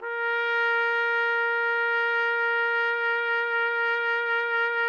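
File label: trumpet; Roland - LA Composer vol. 3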